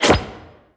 hole open; littlehorn

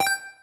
coin penny; horizontalrunner

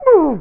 filter hurt

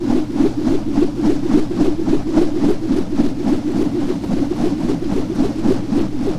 lasso spin slow loop; greed; spinning lariat

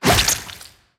throw; decapattack

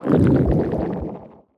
growl medium; fistula

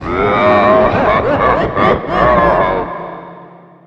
grow; satan

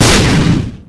boss explosions

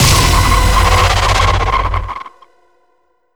light ray fire; dogma

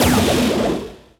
boss shoot; horizontalrunner

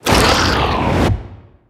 cage jump high

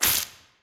iv bag

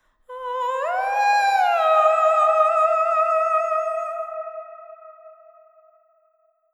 sing; siren

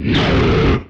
monster roar

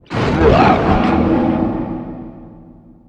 spit; satan

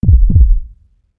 heartbeat

